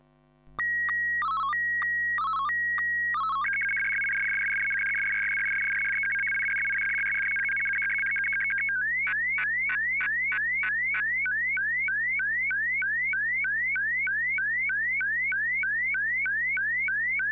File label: ATV125BW QRM, SSTV Signals; hamradio; AVT